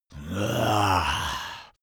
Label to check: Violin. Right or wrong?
Wrong